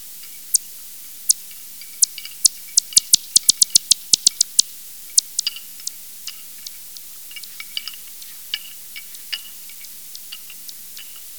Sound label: bat; mouse-eared bats; Bats' Acoustic Blindness: An internet audiovisual illustration